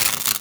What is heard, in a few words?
Worker Welder Start